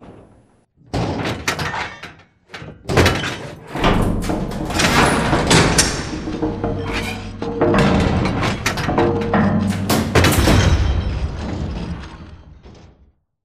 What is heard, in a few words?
dog whistle idle mech close; boneyard; Titanfall 2